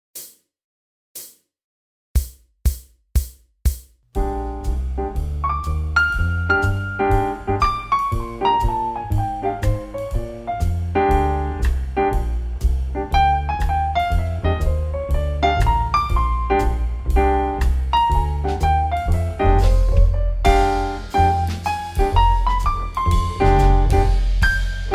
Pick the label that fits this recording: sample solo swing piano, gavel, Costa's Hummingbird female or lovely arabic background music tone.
sample solo swing piano